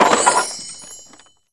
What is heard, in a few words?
powerup